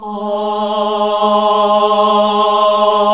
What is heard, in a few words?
HAL AAH, Nuns Aahhh